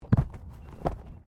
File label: Body movement Fall Medium Double; Body movement foley to simulate falls and deaths; Wrestling And Struggling